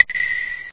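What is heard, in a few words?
sound like a click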